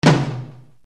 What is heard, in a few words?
RX7 PROC TM1, Yamaha RX-7